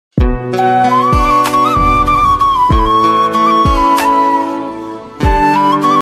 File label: most beautiful and romantic instrumental ringtone